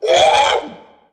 growl

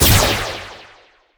laserring weak

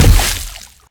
poison hurt

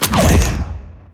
red candle

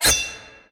dull razor

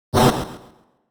spin attack; dogma

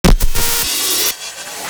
brimstone charge up; dogma

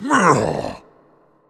punch; bumbino

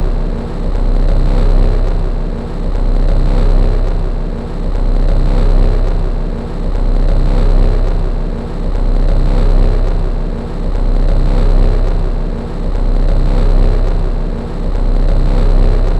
black hole loop; dogma

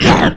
monster grunt

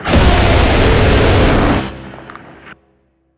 brimstone lazer big

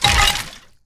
bone death

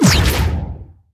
hell portal

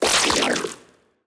attack; gurglings

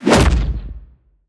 bighorn appear

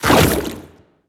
jump; blastocyst

